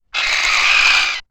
chicken flyer

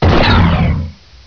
heatray fire, weapon

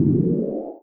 resurrect

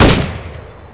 heavy machinegun, weapon

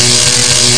quick lightning, weapon

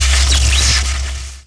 lightning fire, weapon

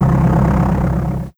light tank move

